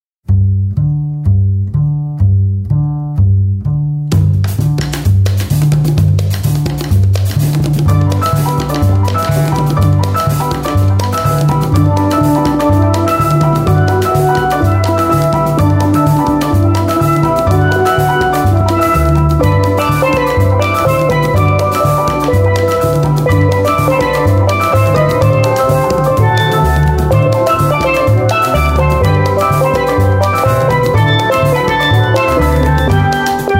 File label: MERENGUE